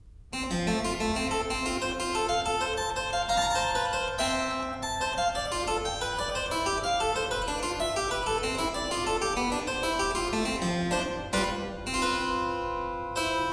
Partita in G major, Tempo di Minuetto, Keyboard Works; J.S. Bach; Partita No.5; BWV 829